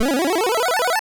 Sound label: retro game sound 8bit; TheStable; Pyralix; Skee-Ball